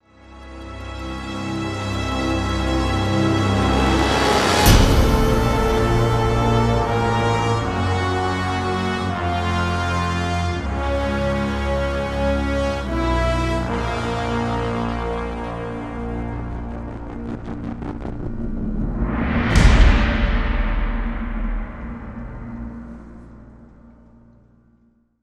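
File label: STRING DRAMATIC; gamerip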